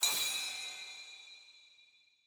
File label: UI Event Spirit Full; bloom minigame